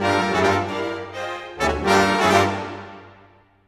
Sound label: Orchestra, Rock Pop